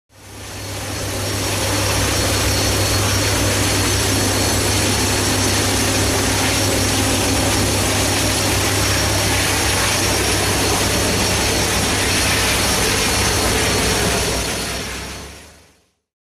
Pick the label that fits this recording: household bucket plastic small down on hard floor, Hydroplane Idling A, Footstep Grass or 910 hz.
Hydroplane Idling A